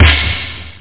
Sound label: high, snare, drums; da capo vol1